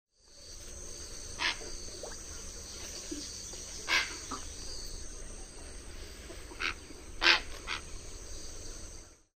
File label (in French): héron mélanocéphale